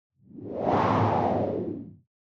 Windy Gust; soundscrate

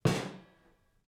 Metal Footfall; soundscrate

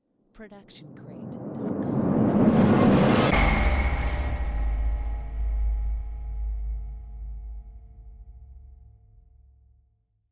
cinematic rise hit; soundscrate

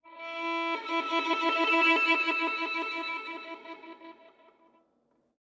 Violin Octave Flutters

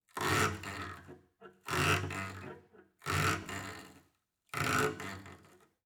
Cello Scratchy Noises Rocking Chair; SoundsCrate

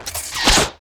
web shooter; soundscrate

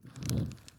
torch swipe; soundscrate

